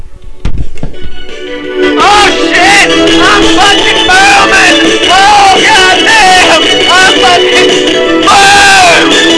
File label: boom